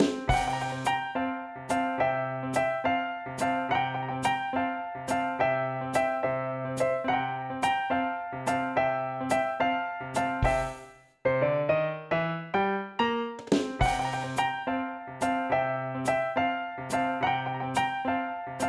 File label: Action; Curious George Downtown Adventure